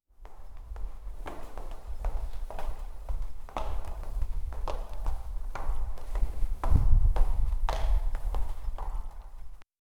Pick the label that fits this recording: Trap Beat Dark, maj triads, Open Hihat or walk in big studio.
walk in big studio